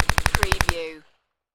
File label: Machine Gun Thompson Machine Gun Long Burst